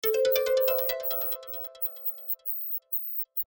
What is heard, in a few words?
Fantasy Harp